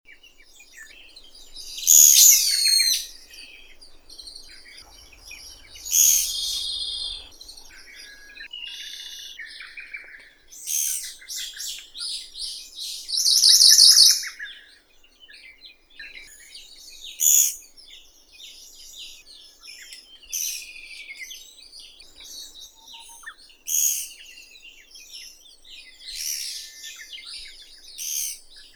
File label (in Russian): dyatel malii ostrokrilii dendrocopos kizuki